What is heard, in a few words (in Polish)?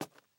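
kroki cichsze pojedynczy; apocalipsis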